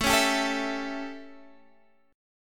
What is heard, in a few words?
A 7th Guitar Standard, chord; strummed; JGuitar